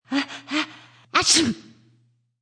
Female sneezes.; Sneezing Sound Effects; Human

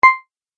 Tap Play Button; Button Sound Effects; multimedia

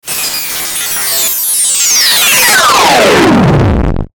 Digital Descend Distortion; glitches; Sound Design; Blastwave

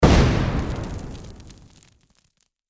Grenade Explosion Debris Blast; Grenade Explosion Dirt Debris; Grenades (Sound Effects:Explosions); Blastwave; Warfare

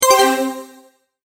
multimedia tone, Goodbye

